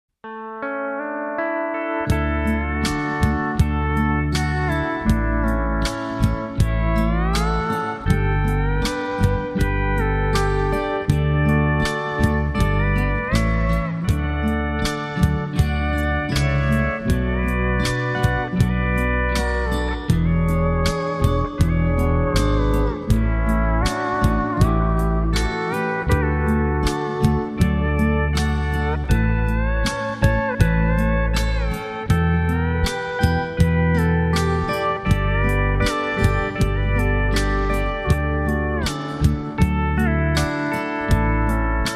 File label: Pedal Steel Ballad